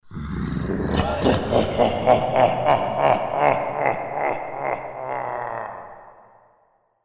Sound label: Evil Laugh In Hall